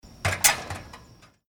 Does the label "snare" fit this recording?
No